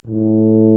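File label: brass, TUBA C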